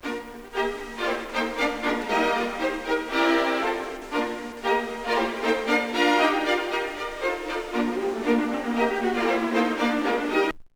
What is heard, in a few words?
Music Original